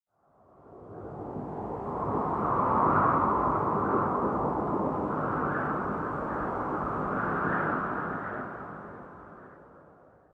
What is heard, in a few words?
snow wind; ambience; cstrike